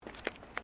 FOOTSTEPS THONGS (FLIPFLOPS) WOODEN DECK STEP; Footstep Foley; Human

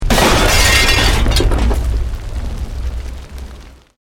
Explosion Medium Brick Wood Glass; Created explosion effects, house, building or vehicle effects; Bomb; Bombs

